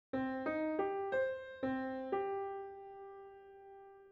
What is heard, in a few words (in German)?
Rhythmus Ubungen; Notenlesen lernen